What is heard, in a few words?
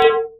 Buzz